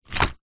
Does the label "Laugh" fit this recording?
No